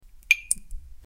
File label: dripping pipe